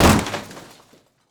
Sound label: door kick